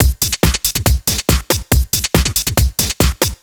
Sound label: Breakbeat 140 BPM; Vengeance Packs; vengeance essential club sounds-3; VEC3 Loops 140 BPM; VEC3 Breakbeats 140 BPM